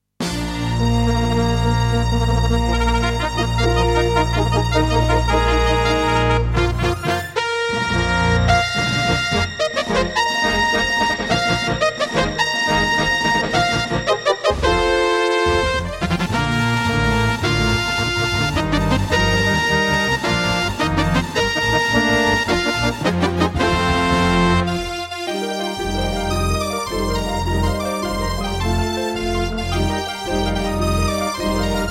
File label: Intro, Casio CTK-2100; Dark Forces